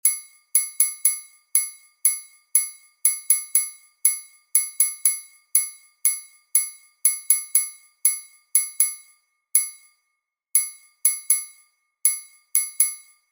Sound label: Bossa Nova Clave; Approaching Bossa Nova Clave